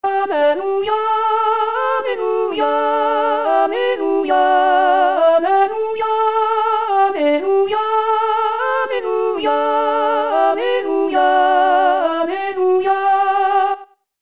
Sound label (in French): Confluence Alleluia; messe de la confluence